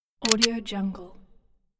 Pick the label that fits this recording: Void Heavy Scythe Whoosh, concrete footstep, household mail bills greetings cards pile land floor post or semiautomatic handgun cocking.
semiautomatic handgun cocking